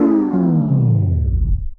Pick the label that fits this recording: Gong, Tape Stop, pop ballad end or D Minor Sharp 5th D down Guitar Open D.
Tape Stop